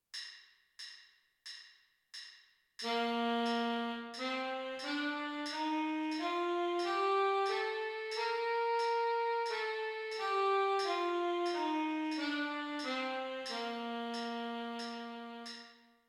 c major scale tenor sax

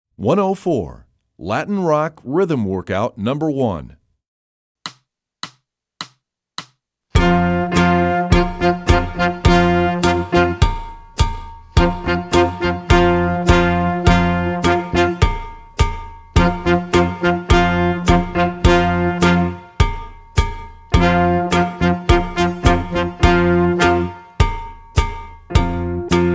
latin rock rhythm workout

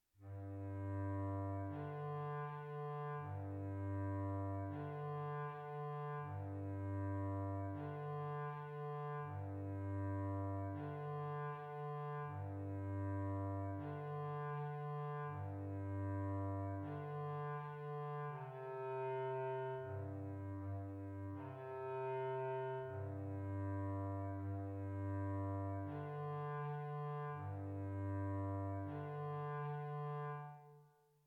bass clarinet; anasazi

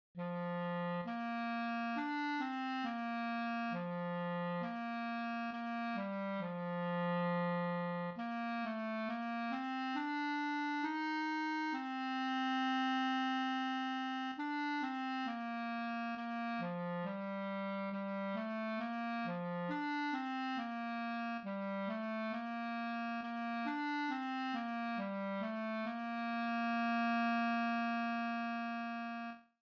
clarinet

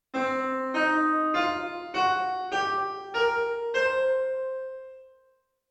c blues scale

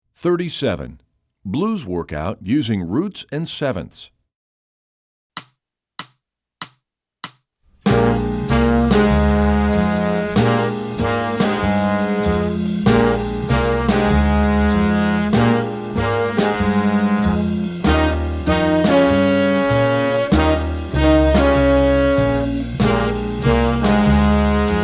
blues workout roots 7ths

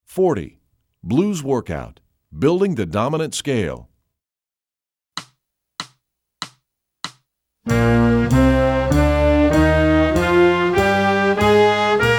blues workout dominant scale

